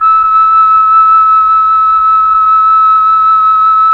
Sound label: FLT, Melotron Flt, Tron Flutes